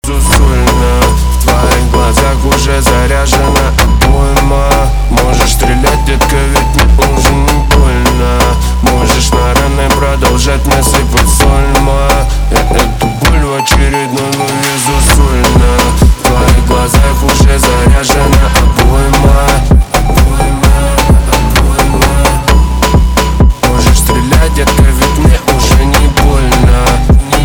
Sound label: phonk remix